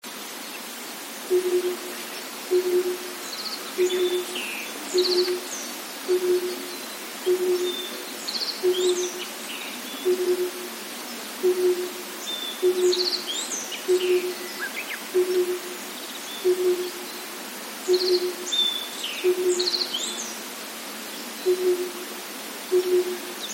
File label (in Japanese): tsutsu